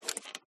BUTTONS SWITCHES; PMSFX

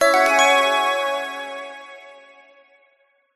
multimedia alert warm bright positive event; zapsplat

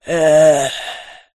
horror zombie groan child

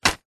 plastic bag thick set down or drop; foley; zapsplat

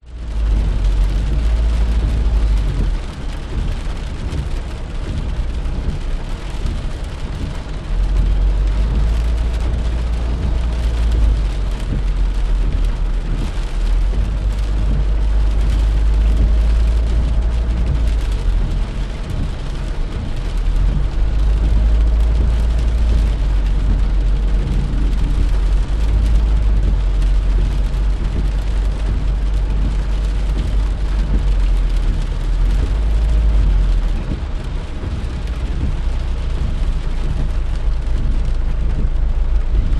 car driving slow traffic jam train internal; transport

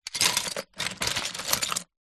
toys lego pieces box pile movement

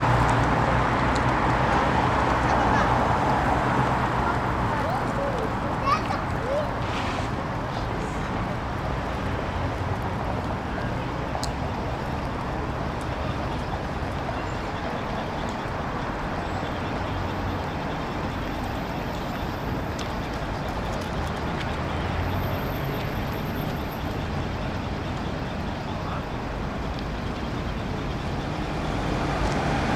ambience london the mall traffic people

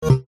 multimedia alert error

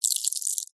ice flower leaf freeze timelapse fast; sound design

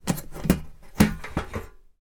office metal file box lid open

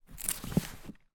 paper pack pick up; foley

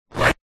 paper glossy hand rub squeak; zapsplat

